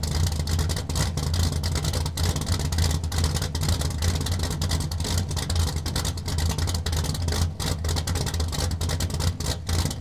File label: Mustang GT350 Idle Loop; Automobiles